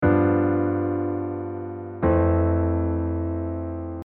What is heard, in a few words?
chord cadence